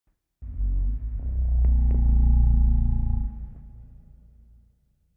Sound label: Mud Dragon idle; Barotraumatic